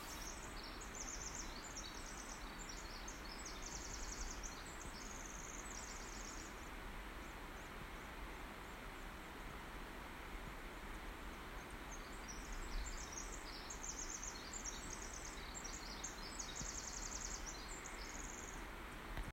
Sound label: birds in ancient forest